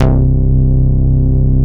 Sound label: DANC, synthesizer bass; Synthesizers; Soli-Music; World of Synthesizers VOL-1